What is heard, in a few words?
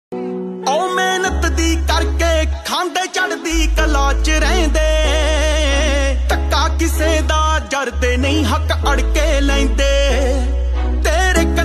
DJ Punjabi Ringtone